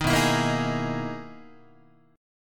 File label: B Major 13th, down, Guitar, BM13 chord; strummed; JGuitar